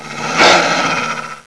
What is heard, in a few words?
gonome jump attack; svencoop